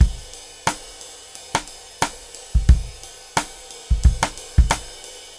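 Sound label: 89 bpm, beat loops; Zero-G; Monster Beats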